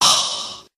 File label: breath exhale; ooh ahh